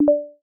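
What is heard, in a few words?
message new instant, Ubuntu System Sounds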